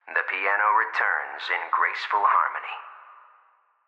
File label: Piano; Sammy; Puzzle Diary